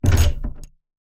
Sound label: attach